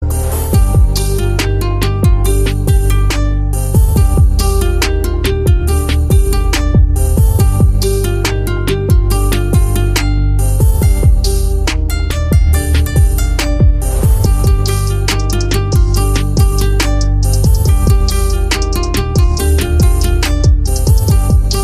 Instrumental Ringtone; Robot 2.0

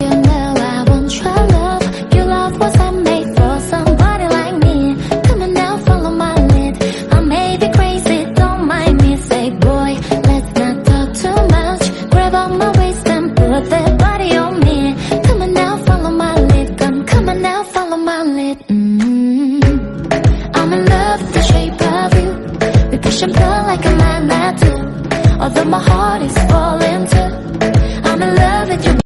Ringtone female version

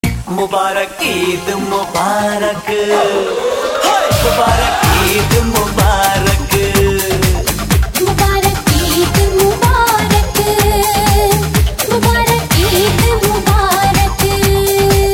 Mubarak Eid Mubarak Song Ringtone

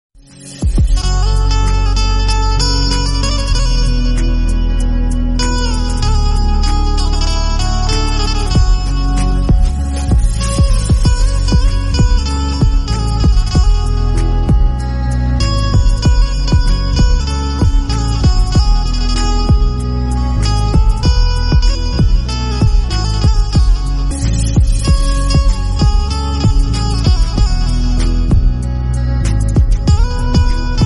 Valentine Instrumental Love Ringtone

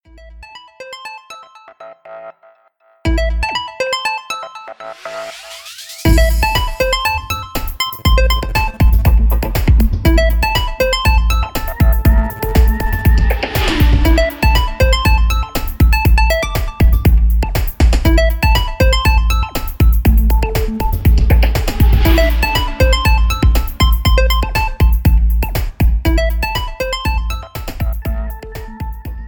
Emotional Lenovo Z5 Ringtone

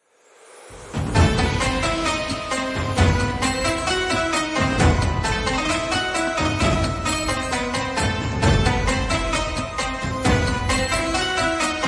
Ramadan Music Background Ringtone

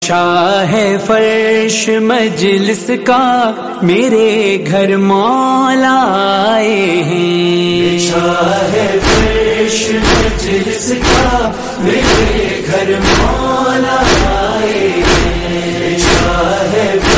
noha Ringtone

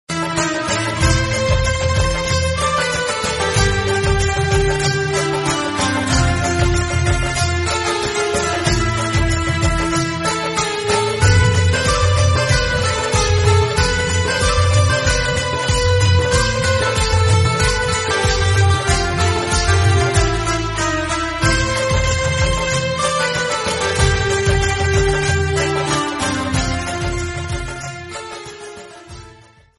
Desh Bhakti instrumental Ringtone

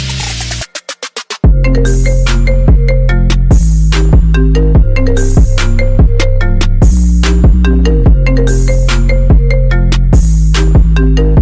Ringtone; Apple Iphone 12

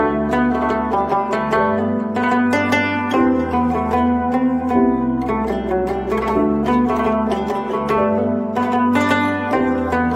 Ramzan instrumental ringtone

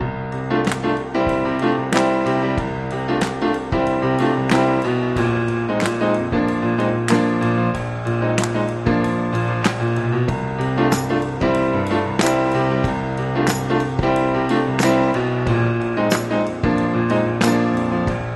Note 8 Holiday Ringtone For android phone

